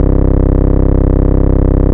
Ultimate Bass Root C; Vengeance Samples

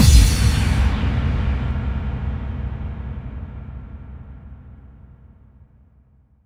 Bassdrums FX, FX Kicks; Vengeance Samples

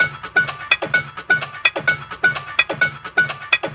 Minimal Loops

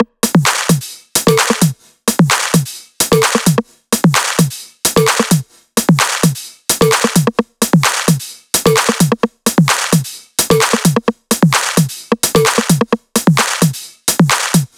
130BPM Kit; Vengeance Samples; Pressure 2 Kit

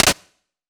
Clap; Vengeance Samples

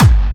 Kick; U Got Me Rocking

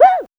Percussive FX; Vengeance Samples; VEH1 Percussion

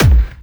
Bassdrums Dirty, Kicks; Vengeance Samples

